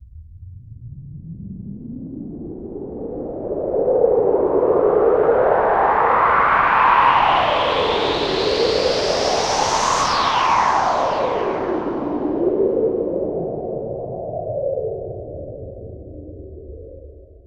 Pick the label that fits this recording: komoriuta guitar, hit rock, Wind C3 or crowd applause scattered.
Wind C3